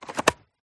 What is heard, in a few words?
Printer Toner Cartridge Open Close Pull out Insert Eject; MKH8060; PMSFX